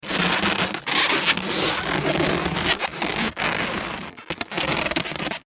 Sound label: Foley Balloon Animal Twist Shape; Balloons; Cartoons